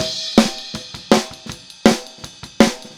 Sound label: Snare, Drums; Take Your Toms Off